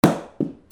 FALLING

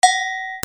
BELLS

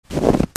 FOOTFALL

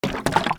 HOUSE

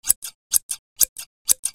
OFFICE

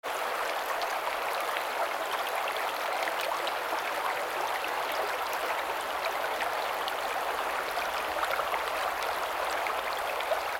WATER

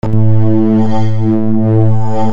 MUSICAL

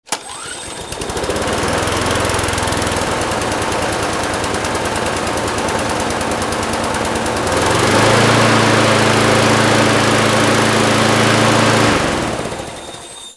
GARAGE

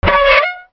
SQUEAKS

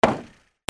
CARS

